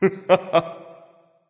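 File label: "heh heh", nonverbal; smite; voicepack; khepri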